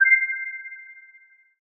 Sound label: Trill, notifications; Google Pixel 4; Pixel Sounds